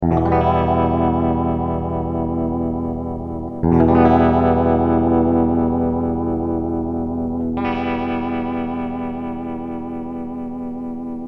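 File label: clean electric guitar voce spin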